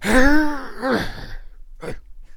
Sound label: Attack Vocals